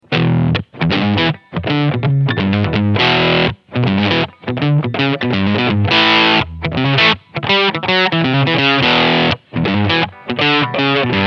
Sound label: womanizer blues break; Damage Control